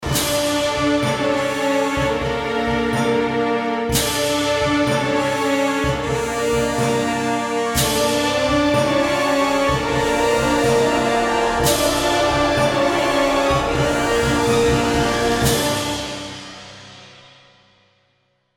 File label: Trailer Stinger